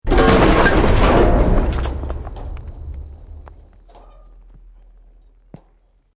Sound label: BUILDING COLLAPSE SCAFFOLDING SMALL